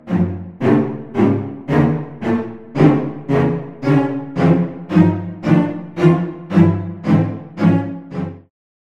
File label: Strings, Cello Spiccato; Synthphonica; Syntheway